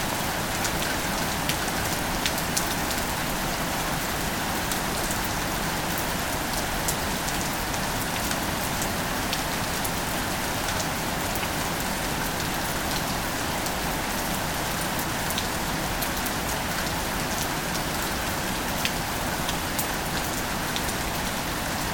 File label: rain at building